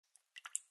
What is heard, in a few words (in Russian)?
zvuki rosy